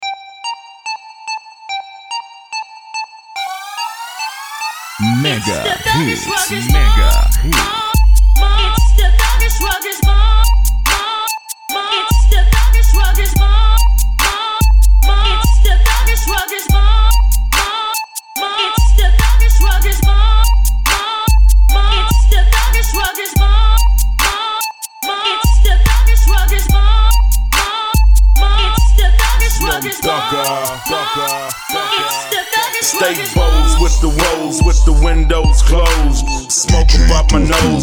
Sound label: Piano